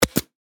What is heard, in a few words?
Pneumatic Stapler, Industry, Gun, Fire; Power Tools; BlastWave